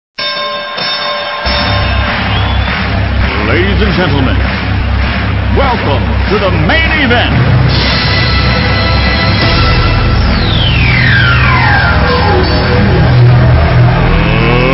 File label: match